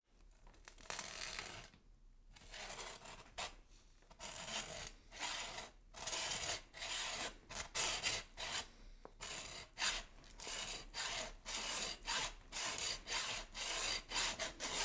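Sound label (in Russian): пиление кости ноги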